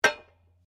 SLEDGEHAMMER METAL IMPACT MEDIUM

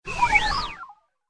Fast Droid Flyby; Robot Sound Effects; Whoosh Sound Effect

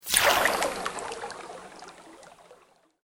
Sweep Organic Medium

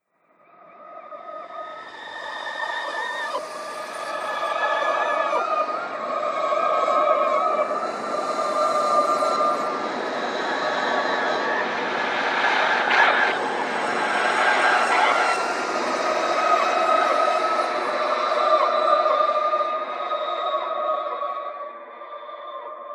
Screams Horror Atmosphere, scary soundscape

